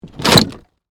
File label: Wood Stress Bend, Nail, Squeak; Foley; Materials